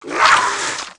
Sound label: Mutant Leap Attack; Valleakan Mutant 1; Aliens